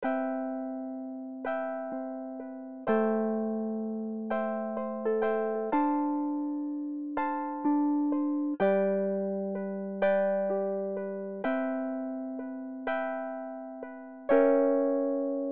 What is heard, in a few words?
Wurlitzer Wurli V3; Arturia